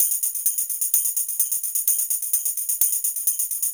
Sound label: Shaker Loop, 128 BPM; Vengeance Samples